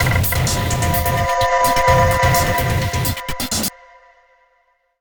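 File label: Jingle Result lose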